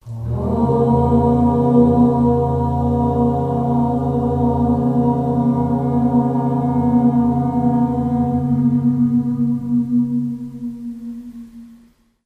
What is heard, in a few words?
Group Chanting Om Aum